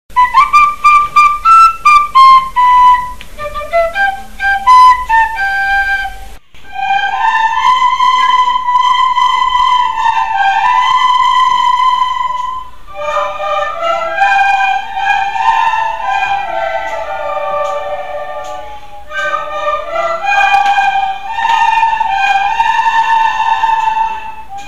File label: only music